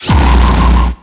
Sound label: beam; rag mega